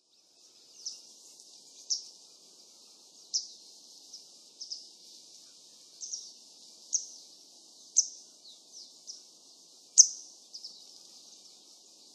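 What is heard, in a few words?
Grey Wagtail; koe; kisekirei; watarase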